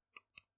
Mouse Click

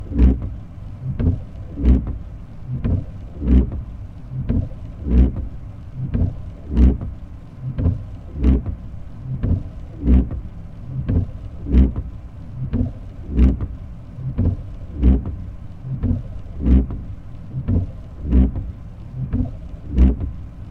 Windscreen Wiper

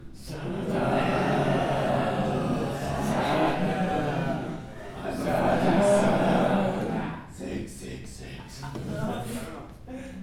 Zombies

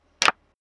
Bone Cracking

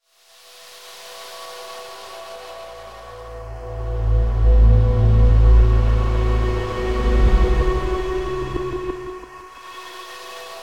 Reversed Sound Logo

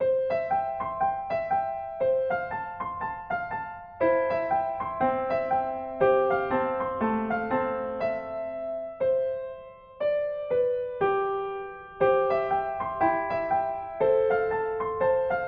Happy Again

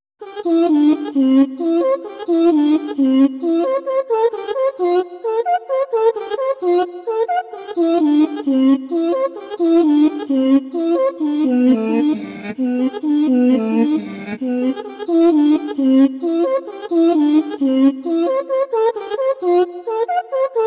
Female Oooh Tune

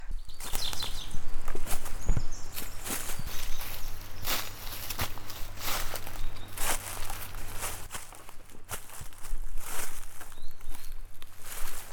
Light Footsteps In Grass

